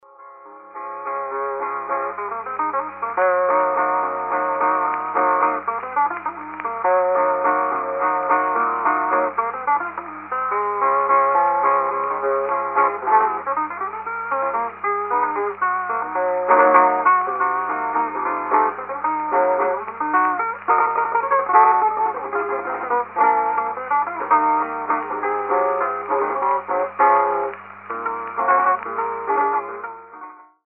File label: La Carnauba Waltz